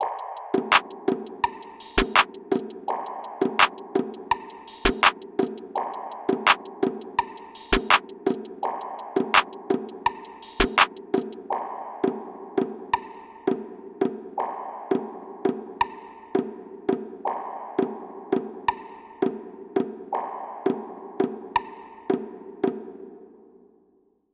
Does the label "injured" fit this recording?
No